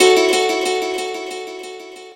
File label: guitar